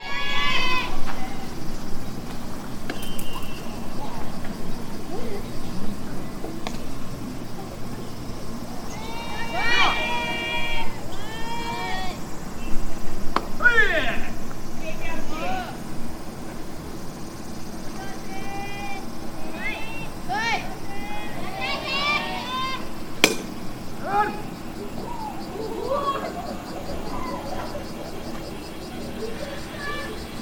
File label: baseball boys; sports